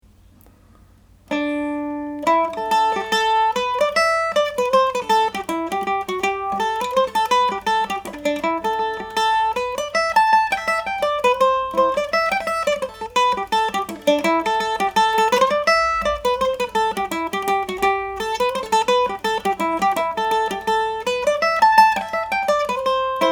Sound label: corner house, Irish Mandolin